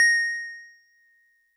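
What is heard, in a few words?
DigiPianet DX100 A#6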